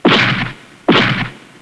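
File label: giant walk; eqclassic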